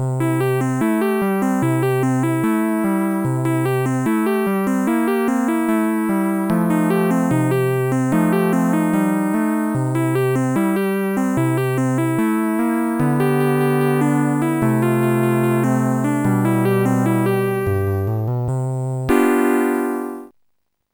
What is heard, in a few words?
blues; Sonix Music Driver